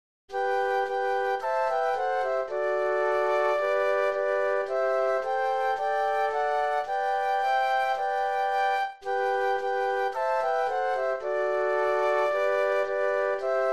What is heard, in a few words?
Flute Trio; christmas